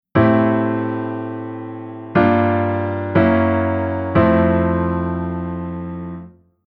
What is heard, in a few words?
Chord Sub V7 Sec Dom; Okikae